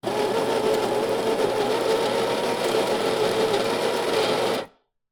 Coffee Grinder, Prosumer, Baratza, Maestro Plus, On, Run, Medium Grind, Off